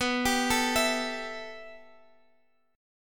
B 7th Suspended 4th Sharp 5th B down Guitar Drop D